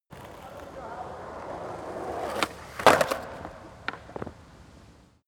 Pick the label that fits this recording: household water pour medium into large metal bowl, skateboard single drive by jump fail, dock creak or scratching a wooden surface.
skateboard single drive by jump fail